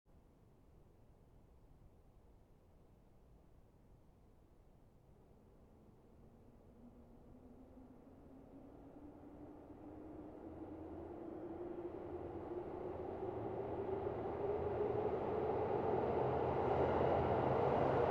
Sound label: subway